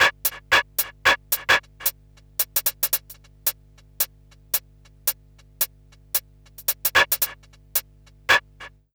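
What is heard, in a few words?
hi-hat, drum loop; Big Fish Audio; Uncivilised G'rooves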